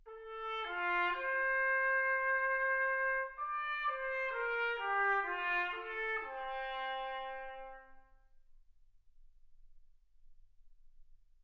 trumpet

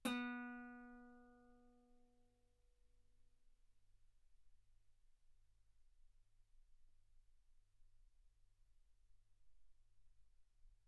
Guitar ff sul B B3; single mic

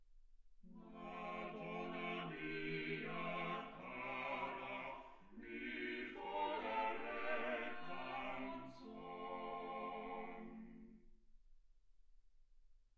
quartet

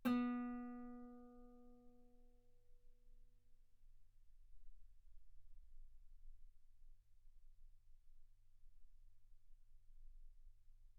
Guitar B3

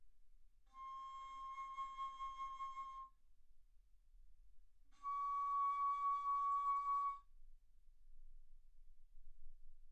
Bass Flute C6 Db6; ff

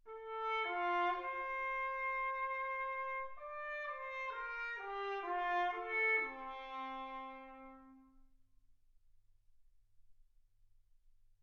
trumpet

